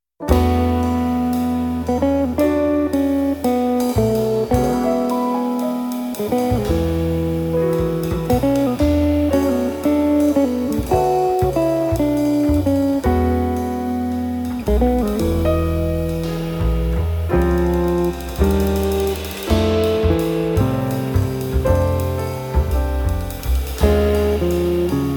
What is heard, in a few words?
Waltz